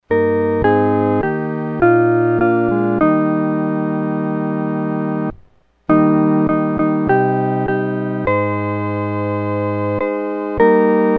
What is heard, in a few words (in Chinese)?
合奏 四声部